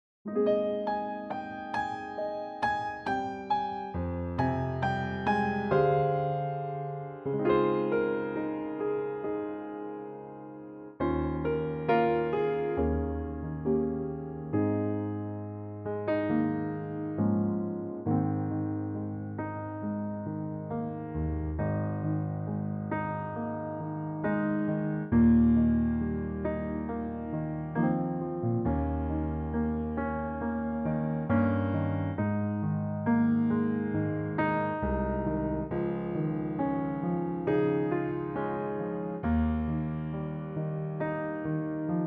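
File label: piano track Ab A, backing track; key - Ab to A - range - Eb to C#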